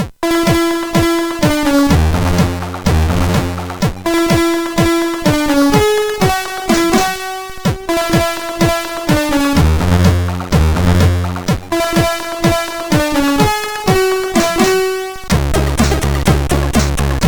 Music Sample, sid CSG8580R5

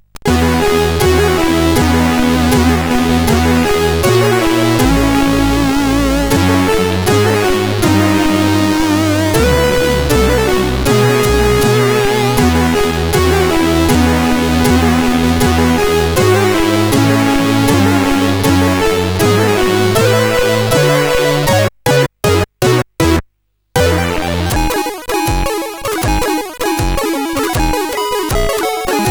Christmassy, CSG8580R5

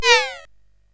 Dark Castle, CSG8580R5

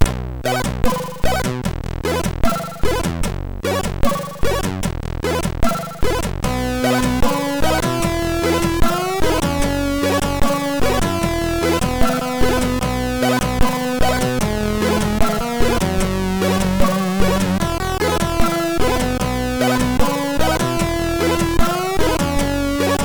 Reggae, MOS6581R3; HVSC

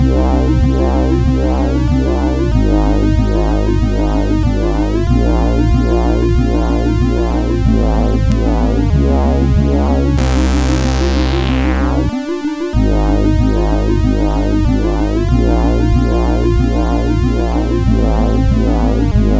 Woodbox, CSG8580R5; Griff; hvsc

